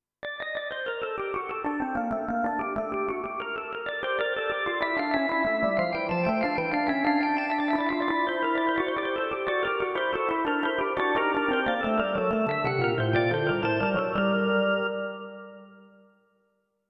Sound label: Light Organ